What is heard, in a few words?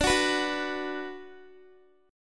D 6th D down Guitar, chord; D6 strummed; tuning 4F,4A,5C,5G,5C,6E